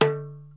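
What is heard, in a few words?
dara 1 hit, percussion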